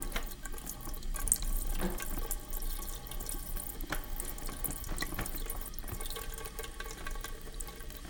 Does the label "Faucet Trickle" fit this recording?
Yes